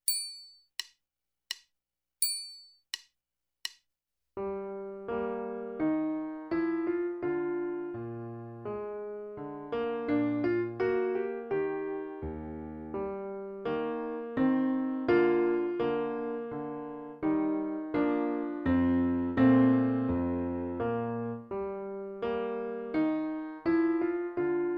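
Minuet - Bassoon, Alto Clarinet, Alto Saxophone, Baritone Saxophone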